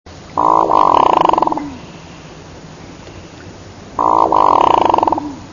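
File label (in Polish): Kapturnik - Lophodytes cucullatus, głosy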